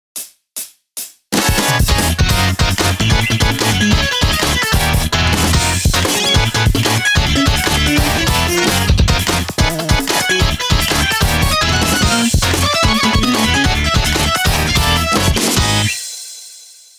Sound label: tone